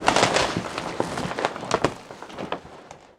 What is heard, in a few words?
wallpaper rip